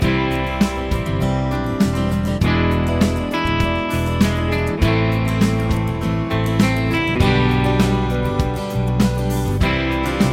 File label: progs with applied chords